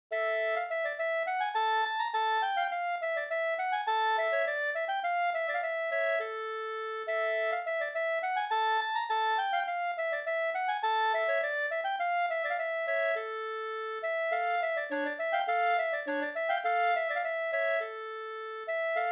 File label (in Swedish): halling